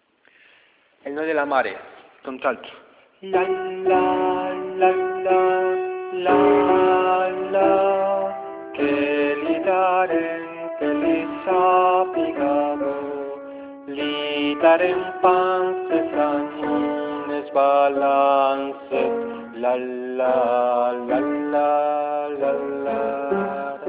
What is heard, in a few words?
alto